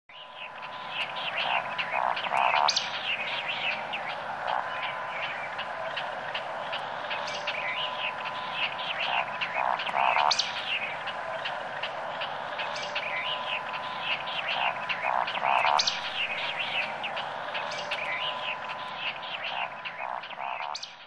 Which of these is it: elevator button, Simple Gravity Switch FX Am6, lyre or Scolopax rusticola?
Scolopax rusticola